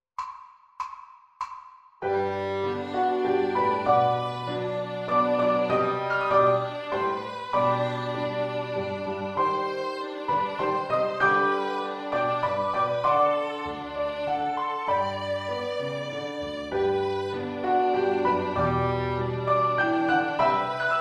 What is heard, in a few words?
Violin Piano; ViolinSchool